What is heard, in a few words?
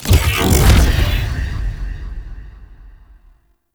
Iron Shield Strip Cast; Warframe